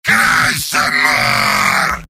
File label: yell; heavy; video games; source engine; tf; mvm